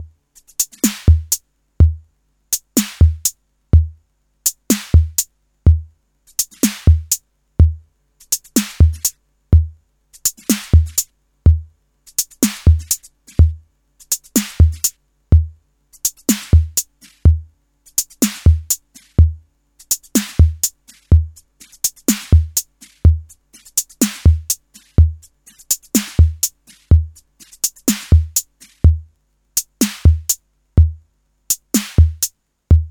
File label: Boss DE 200 Digital Delay Sync TR 606; Sampling